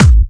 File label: Clubby Kicks, Bassdrums